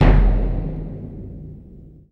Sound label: sfx perc